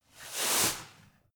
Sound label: broom sweep soft